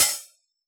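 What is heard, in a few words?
T9 hi-hat Open, Yamaha DJX; 155 DJX Kit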